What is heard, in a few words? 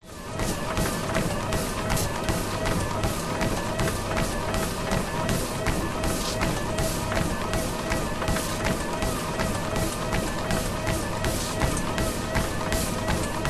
treadmill jogging sneakers motor whirr; sport; zapsplat